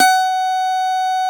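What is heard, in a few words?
Steel Acoustic Guitar A3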